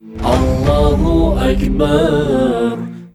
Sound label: allahu akbar song